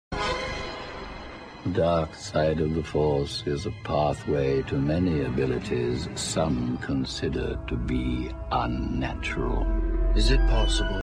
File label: mate 20 lite huawei ringtone